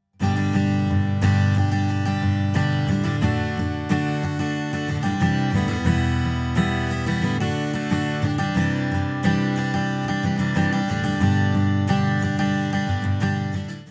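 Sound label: Acoustic Guitar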